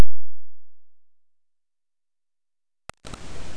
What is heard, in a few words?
warning